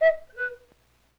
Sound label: LoFi Cuckoo, Vintage Cartoon Foley